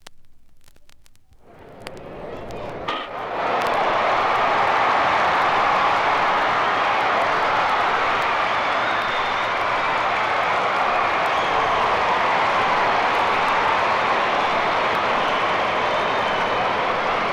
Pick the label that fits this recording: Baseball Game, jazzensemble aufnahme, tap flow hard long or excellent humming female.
Baseball Game